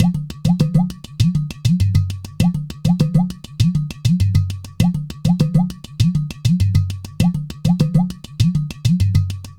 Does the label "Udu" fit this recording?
Yes